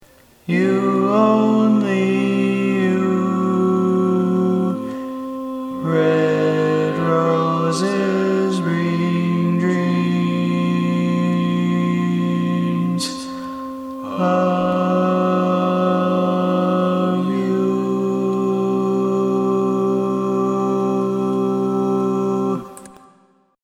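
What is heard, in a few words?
Baritone, barbershop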